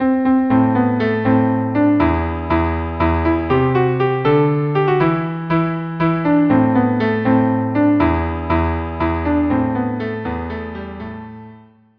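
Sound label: cello cello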